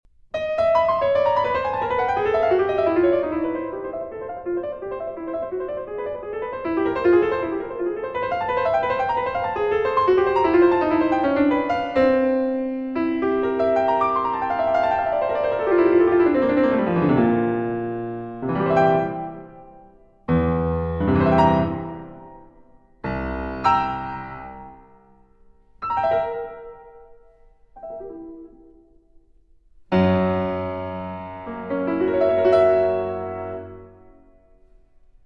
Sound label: Freie Fantasie fürs Clavier in F-Sharp Minor, Allegretto